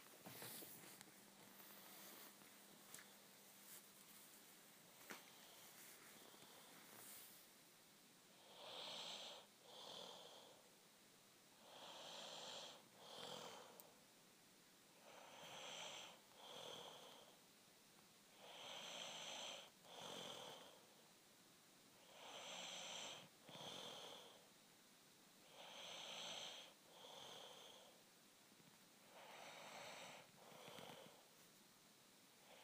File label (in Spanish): ronquido